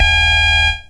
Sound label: alarm